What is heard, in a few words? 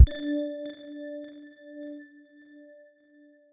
single reel button click